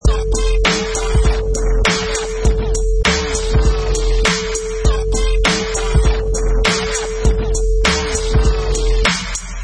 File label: Vinyl Scratch Hits 100 bpm with beat